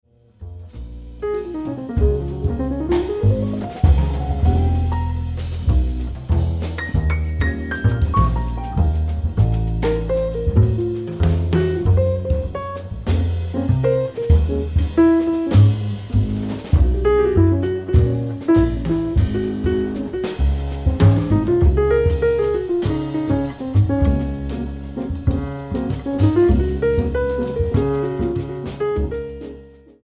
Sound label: Celebration Samba